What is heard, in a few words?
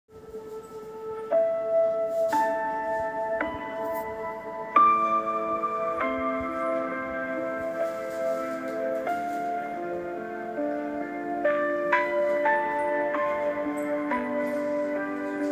classical sad; AI Music Generation; Hybrid Framework